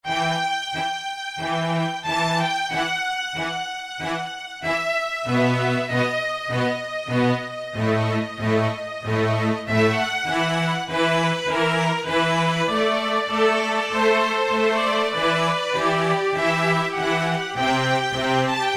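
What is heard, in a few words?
Solo & Section, E-mu PK-6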